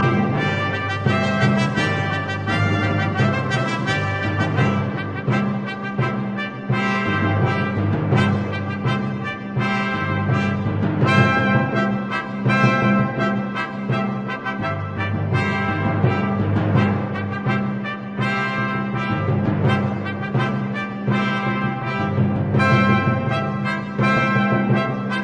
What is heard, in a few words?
Fanfares; Josef Suk